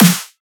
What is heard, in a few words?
Dubstep Snare; Ghosthack; Dubstep Sound Arsenal